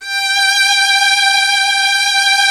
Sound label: G4 hi, lush strings; InVision Interactive; Lightware VOL-1; Instruments & Percussions